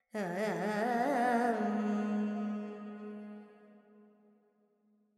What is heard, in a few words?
Verb Dorian, Vocals, Carnatic Female, Alaps, 70 G; Sample Banks